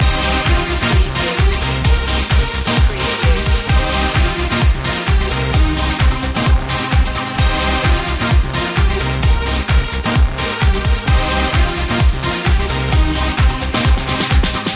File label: plasma life loop